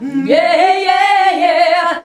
VOX, Singin

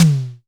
Snare - Med; Tama TS-206

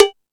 Dance, Drum Machines

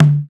African Perc

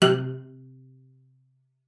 C3, CELLO FINGER; Xsample VOL-5

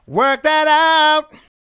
Work That Out, Male Vocals

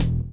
blow; Spectrasonics; Metamorphosis

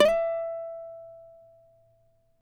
CAVAQUINHO SLIDE, Guitars

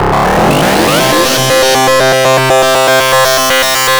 GRUNGE

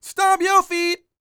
SING, Vocal Planet R&B & Dance; ILIO

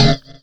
skidz; E Lab; XTc Files of House

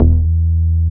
DR. LOOP, BASS; X-Static Goldmine 3

